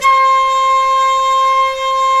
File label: WND FLT MT, Flute & Mute